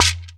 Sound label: PER Percussion